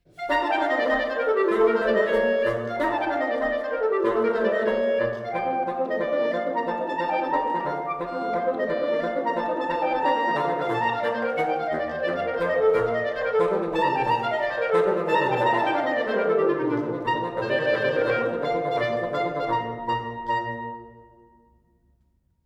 Duos for clarinete & bassoon, in B flat major, Allegro assai; Ludwig van Beethoven; Brilliant Classics; Music for Wind Ensemble I